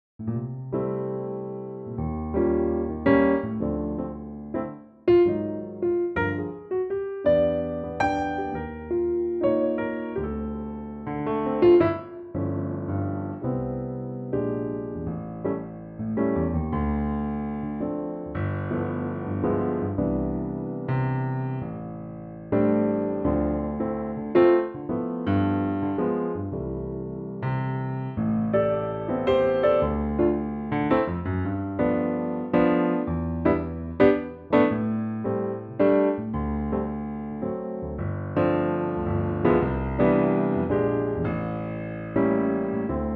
piano trak C; key - C - vocal range - F to A; Bubbly jazz tinged piano only arrangement of this classic fesive gem!

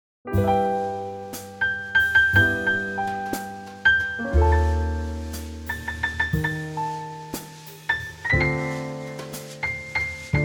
C Trio; key - C - vocal range - C to Eb

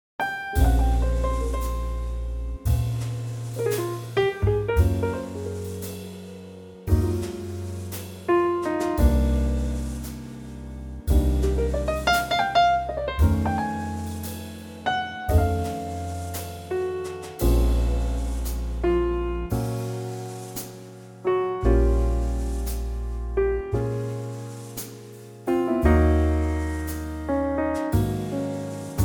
Bbm trio; key - Bbm - vocal range - Bb to C; Gorgeous laidback Trio arrangement

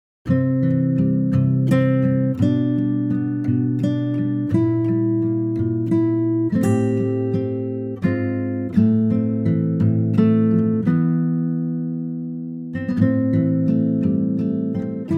C acoustic guitar; key - C - vocal range - F to G; Wonderful acoustic guitar arrangement of this old favourite

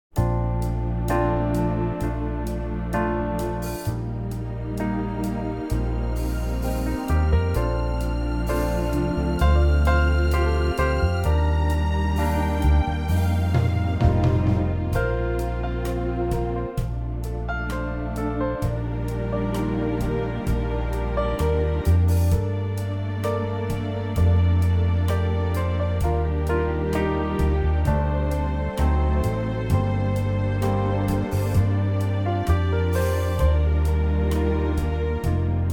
Bb orchestral

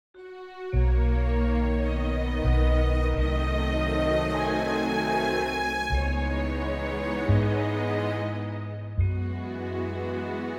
B to C orchestral; key - B to C - vocal range - Gb to D

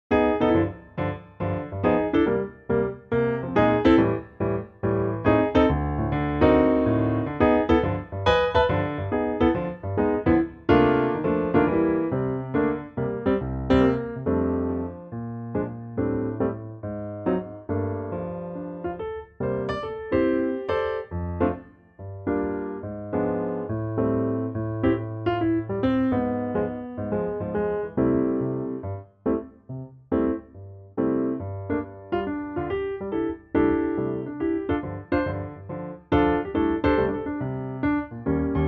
piano F

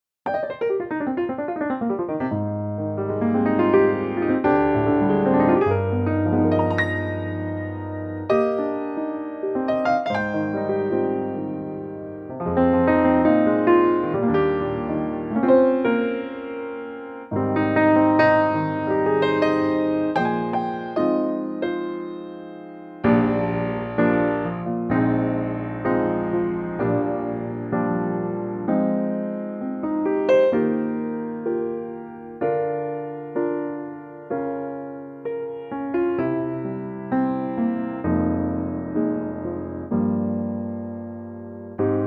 Eb F Piano; Pianotrak; key - Eb to F - vocal range - Eb to Bb

